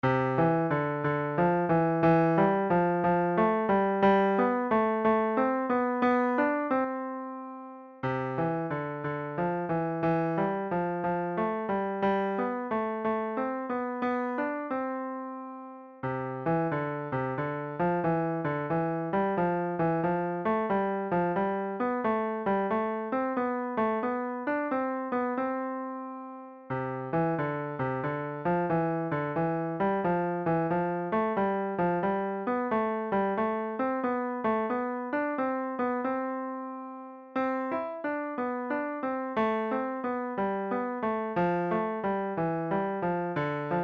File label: trichord type2